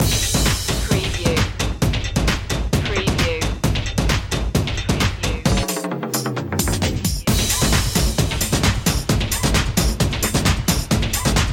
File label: brazilia